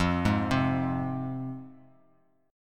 F Minor, Bass Standard; x,8,6,5; Fm arpeggiated; JGuitar

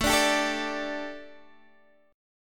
A 7th Suspended 4th Guitar Open D, chord

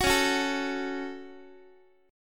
Db Suspended 4th Sharp 5th, Guitar chord; strummed; JGuitar

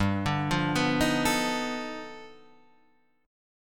G 7th Flat 13th; arpeggiated; JGuitar

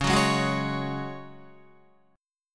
C sharp Suspended 4th C sharp down Guitar; C#sus4 strummed; JGuitar

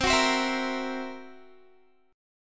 C 7th Flat 5th C down Guitar; C7b5 strummed

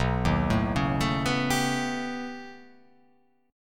B Augmented 9th B, chord

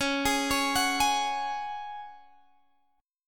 C sharp Suspended 4th, Guitar, Drop D

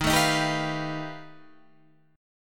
F 9th Flat 5th D sharp

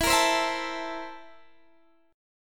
C 13th E

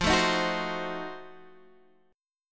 F 7th Flat 9th F Guitar Half Step Down

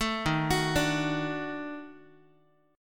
D sharp Minor Major 7th Flat 5th D sharp Guitar; arpeggiated

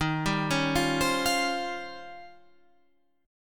Eb 13th, Guitar, Half Step Down

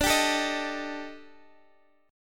D sharp Major 7th Flat 5th D, chord

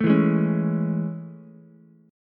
A Major 7th A, Ukulele; AM7 strummed; JGuitar

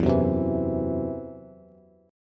E Suspended 2nd Sharp 5th, Bass; Esus2#5 strummed; 12,9,10,9

